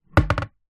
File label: household waste paper basket plastic empty knock over wood